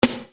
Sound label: Snare Dr